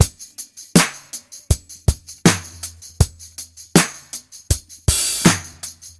80 bpm drum beat e key, Free breakbeat - kick tuned to the E note. Loudest frequency: 5775Hz